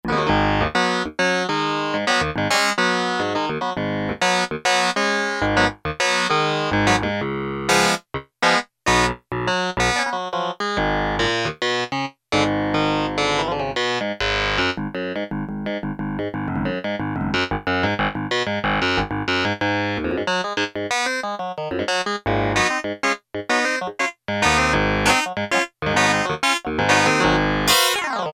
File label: CLAV 1, Yamaha DX7